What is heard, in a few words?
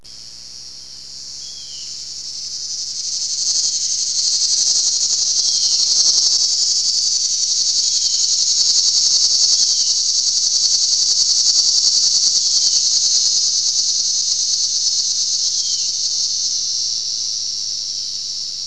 linnei, Michigan Cicadas